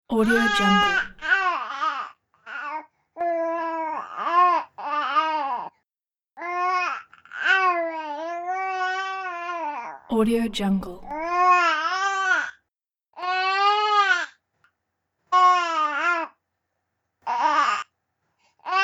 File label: baby crying hungry